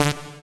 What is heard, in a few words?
SYN Poly D; Best Service Gigapack 1